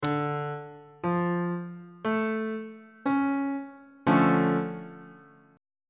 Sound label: e double flat minor major 7th chord on bass clef